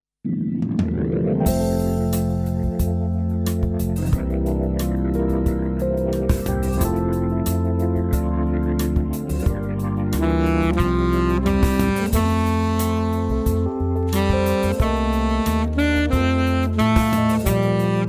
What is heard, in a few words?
Alto